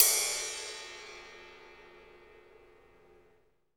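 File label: Cymbal Ride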